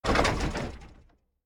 tank engine stop; factorio; Factorio 0.14.21; fight

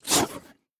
spitter; factorio; Factorio 0.14.21; creatures

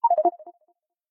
console message; factorio; Factorio 0.14.18

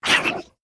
spitter death; factorio; Factorio 0.14.21; creatures

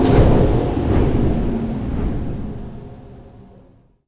fan off; CounterStrike; cstrike

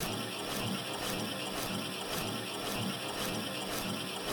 assembling machine; factorio; Factorio 0.14.21

